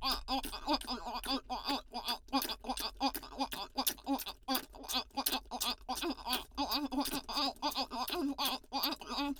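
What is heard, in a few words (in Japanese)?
フェラ音（激しめ）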